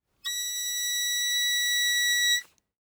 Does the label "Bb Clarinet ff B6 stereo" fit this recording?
Yes